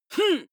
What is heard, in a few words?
male fight groan; vocals; comedy-cartoon; soundpool